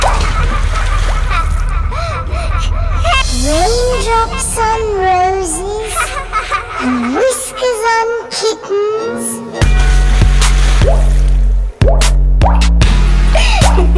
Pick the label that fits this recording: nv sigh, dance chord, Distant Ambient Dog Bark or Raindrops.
Raindrops